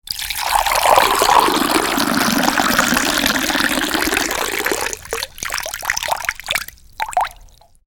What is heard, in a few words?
food drink pour milk into jug; sound effects one